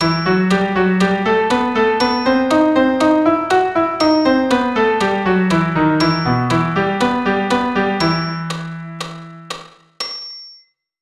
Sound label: Em harmonic